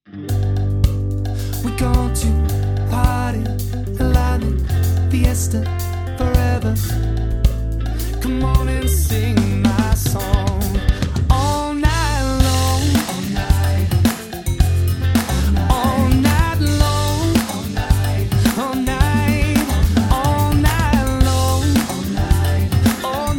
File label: party wedding band